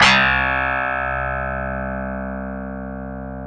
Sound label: GUITAR, Drum & Bass, 160 BPM; Ueberschall; Drum & Bass Resonance